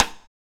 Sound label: Stick; PLUTO KIT